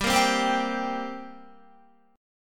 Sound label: G-Minor Double Flat 5th G down Guitar; strummed; JGuitar